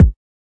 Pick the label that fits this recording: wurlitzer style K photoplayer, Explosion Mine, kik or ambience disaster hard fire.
kik